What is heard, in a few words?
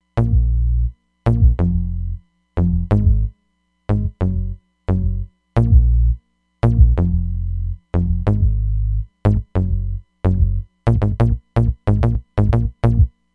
HipHop Bs, Roland Fantom XR